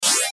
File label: Cell Message Harp Up Chime; Chimes; Multimedia; Technology; Blastwave